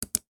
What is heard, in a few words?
clicky